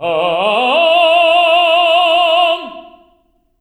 TENARP F3, tenor arp, voices

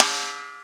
Yamaha CS6, Drums

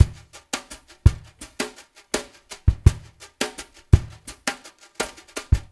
DRY DRUMS; Spectrasonics; Liquid Grooves 1 & 2

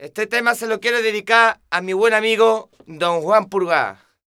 Flamenco, Vocals, Male

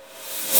Reverse Fx; musicradar

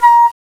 JAZ FLUTE, FAIRLIGHT CMI IIX WOODWIND

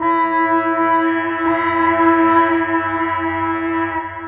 Horn Drone, Woodwind

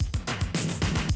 110, Classic Drum Loops

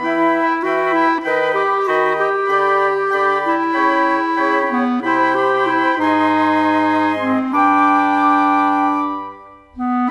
Winds, Orchestral, Rock Pop; Sonic Foundry (Sony Creative Software); Orchestral Series VOL-4; Rock and Pop; Rock-Pop 04

